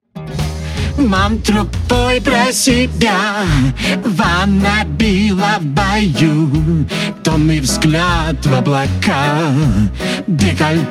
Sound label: Choir; RVC